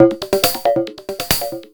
percussion loops, 138, Techno; PS-45 WAV Techno ID

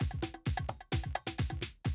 130, Classic DrumLoops

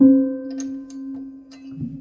Prepared Piano; Battery 3 Sample Kits

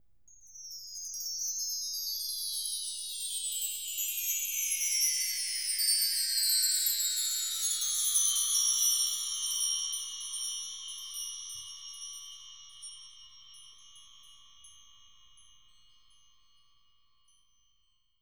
Btree, BELLS; Roland MV8800

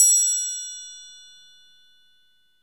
TRIANGLE; Best Service Advanced Media Trax Modern Composer; LATIN PC

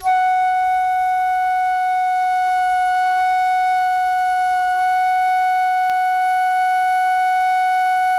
FLUTE MF F#5; Greytsounds - Philip Wolfe David Crozier's Saxophones; FLUTE MF-PW

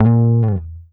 A#1 GTR TRIK; Univers Sons; Jazzistic

